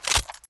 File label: clip in, weapons, r8; csgo